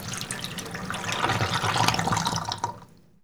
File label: FAUCET, KITCHEN; AKAI S6000 CD-ROM - Volume 6; Daily Life